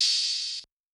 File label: open hat; FL Studio; xander brown drumkit